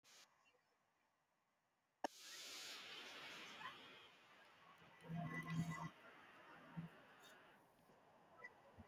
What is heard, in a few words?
theme